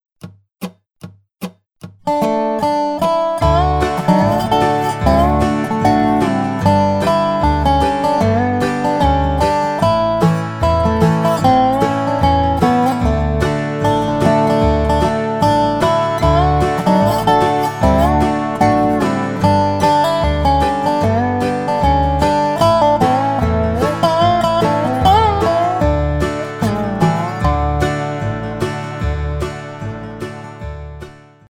key of D Dobro and backing track